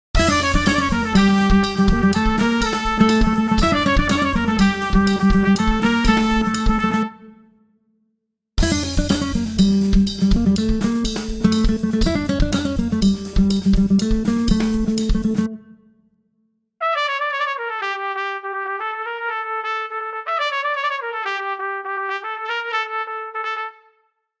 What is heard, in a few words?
ethno